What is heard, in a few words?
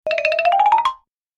Cartoon running movement funny